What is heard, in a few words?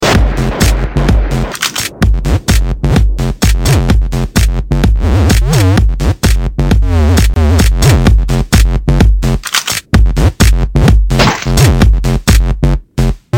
Electro Ringtone